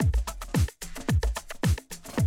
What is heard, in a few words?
Classic DrumLoops